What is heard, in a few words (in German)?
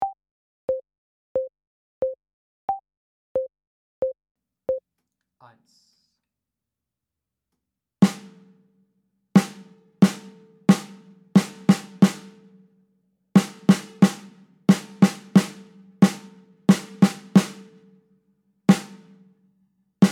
Duett Snare ohne Click